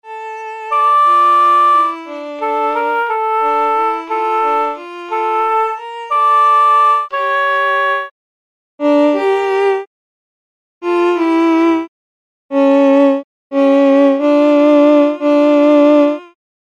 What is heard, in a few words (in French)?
AT; La valse de Chosta